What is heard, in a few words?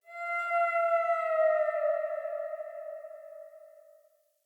Wolf Cub Landscape Howl; Vocals; Animals